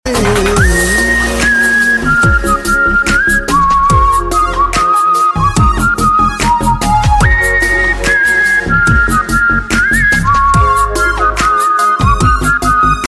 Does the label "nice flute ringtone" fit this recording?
Yes